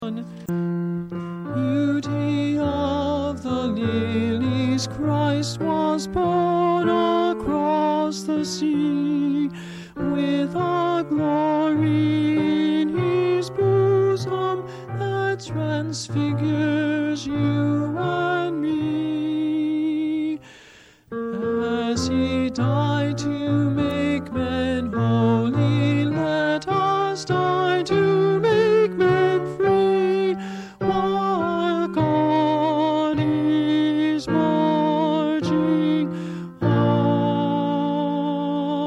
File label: Tenor I; Battle Hymn; District II 2003; Mixed Choir Practice